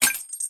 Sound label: wheel stopped